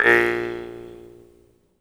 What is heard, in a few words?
jaw harp, Pitched Percussn, Jaw Harps